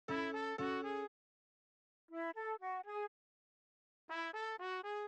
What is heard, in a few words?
piano instr